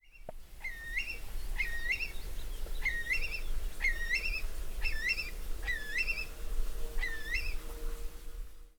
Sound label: alarm, curlew